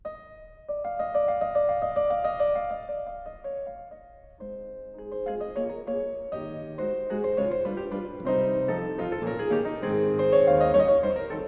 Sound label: Mazurka In A Flat Major, Piano; Chopin